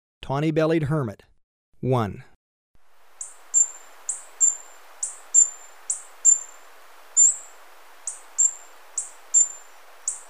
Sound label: Tawny-bellied Hermit; S. America